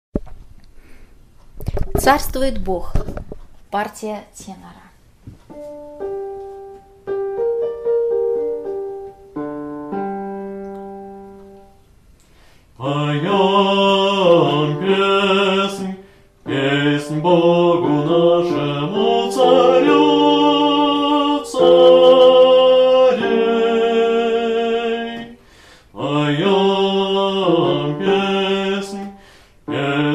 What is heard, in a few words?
tenor; Carstvuet Bog